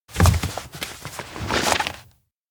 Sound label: stand take book shelf; anemoi